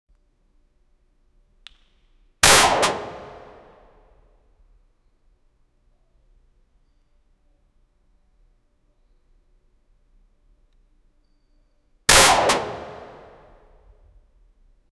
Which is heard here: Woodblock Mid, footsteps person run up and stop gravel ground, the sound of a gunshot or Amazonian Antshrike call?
the sound of a gunshot